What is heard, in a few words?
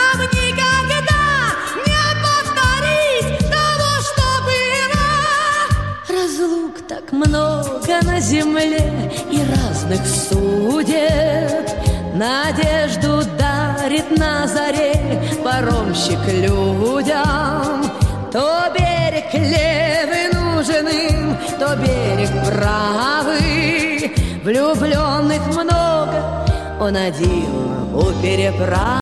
vokal high ringtone